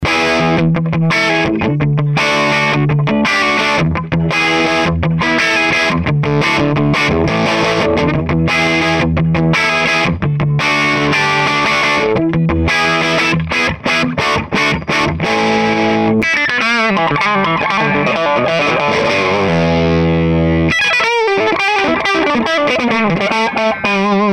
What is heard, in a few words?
les paul